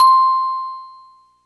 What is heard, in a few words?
Ensoniq SQ-1 Music Box C6